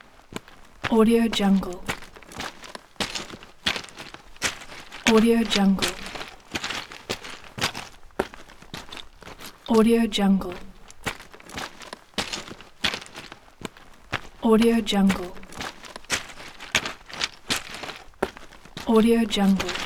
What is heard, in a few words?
walking on rocks; راه رفتن روی صخرهها